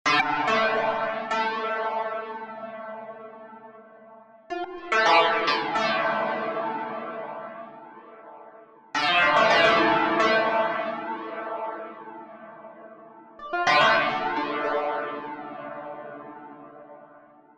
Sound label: pizz dance